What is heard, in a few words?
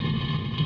block; TileWorld